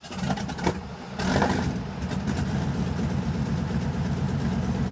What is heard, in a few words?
start engine, engines; World of Tanks